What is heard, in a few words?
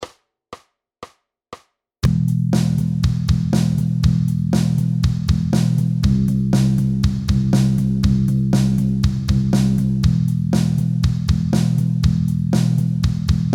Backing